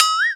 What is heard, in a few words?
Boing